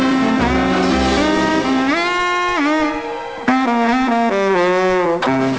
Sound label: solo